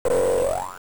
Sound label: sproing